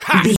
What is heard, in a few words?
voice male attack